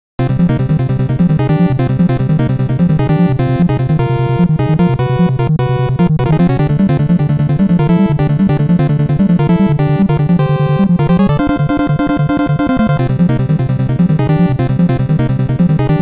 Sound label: Subway Ride; Stage 5; Castlevania Anniversary Collection